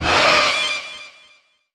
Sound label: Screech Echo; Lethal Company